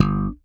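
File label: SBF G0 SHORT, FUNK BASS; Northstar - New Gold VOL-1; SPEC.FUNKBAS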